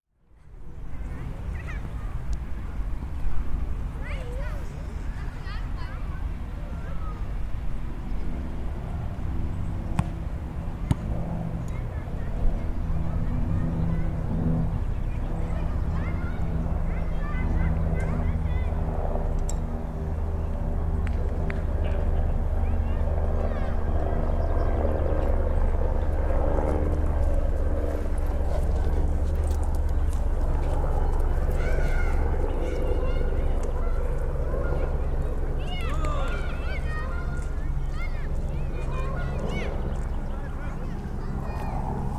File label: goose at volksgarten is cheering for soccer players; koeln